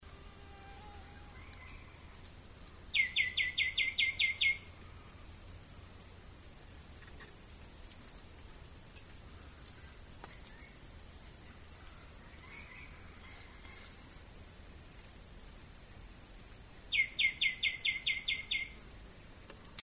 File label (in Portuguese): canto do caneleiro preto